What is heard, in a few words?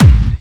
Bassdrums Clubby Kicks; Vengeance Samples